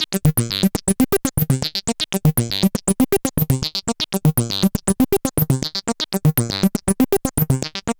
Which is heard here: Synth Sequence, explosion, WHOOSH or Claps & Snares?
Synth Sequence